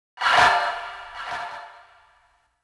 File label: Biwa Tune; Samurai Shodown NeoGeo Collection